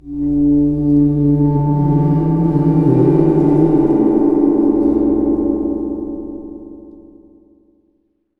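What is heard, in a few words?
CYMBAL GONG; NorthStar - Global Instruments VOL-2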